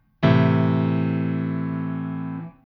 Am lowest, chords

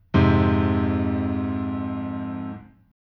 Fm lowest, chords

